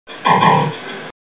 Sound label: saucer; Pro Android Games; Asteroids